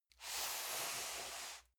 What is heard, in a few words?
cardboard box medium slide soft; objekte